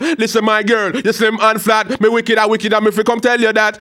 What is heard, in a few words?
HAL RAGGAM, RAGGA-MUFF A maj; Sound & Vision - Gigapack II